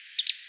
song

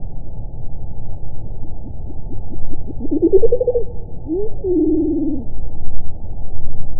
zweeoo, Empidonax traillii; Passeriformes; Tyrannidae

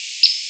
song

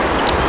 song

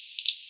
song3, Empidonax difficilis; Passeriformes; Tyrannidae

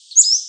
song

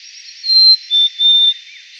song, Paridae, Poecile gambeli; Passeriformes

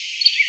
song

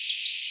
wefl or song2; Passeriformes; Tyrannidae

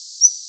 wefl or song1; Passeriformes; Tyrannidae

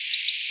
song

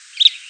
song

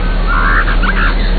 churr, Empidonax alnorum, Tyrannidae; Passeriformes

